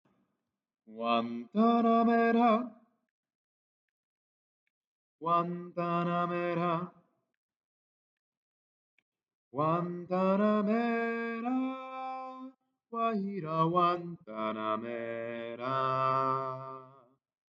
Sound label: tutti bass